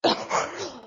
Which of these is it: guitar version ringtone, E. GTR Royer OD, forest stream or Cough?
Cough